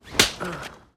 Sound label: human slap face male moan